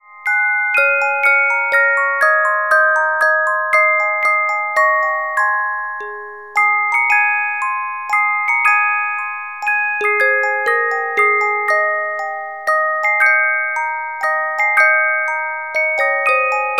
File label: Carillon Music Box Melodies